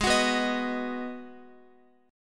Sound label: Ab Minor 7th down Guitar; strummed; JGuitar